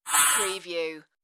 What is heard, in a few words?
computer interface message